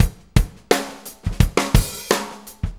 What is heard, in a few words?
Fonky, Drum Tools, Loops